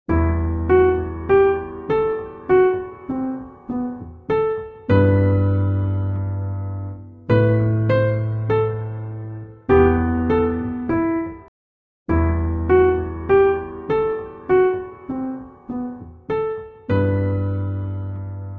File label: piano loop